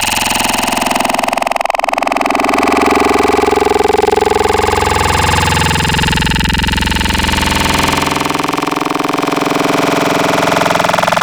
Growl Tables; Cymatics; Growl Tables Vol 3; Serum

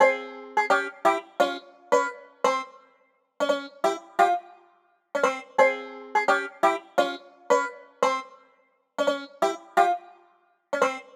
Banjo, 086 Cmin

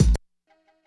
Kick, 085 Cmin

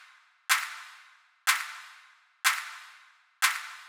Big EDM Clap Loop, 123 BPM; Future House Tools; Big EDM Drum Loops

